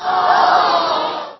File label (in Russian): zhaloba tolpi